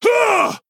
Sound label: female quilboar, Attack